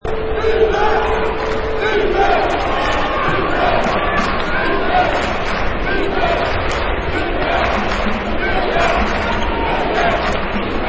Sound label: Arena Crowd Noise